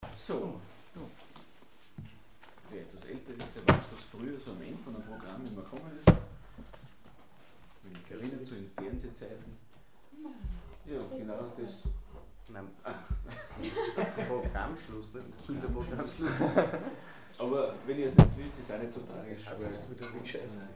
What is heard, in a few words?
austrian lullaby